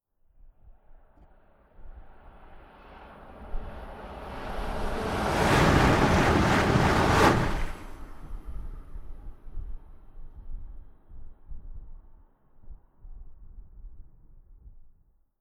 Eurostar High Speed Train Passby Short Train Medium Speed; Transport; R To L; Train Sound Effects